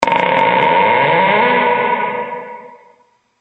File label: door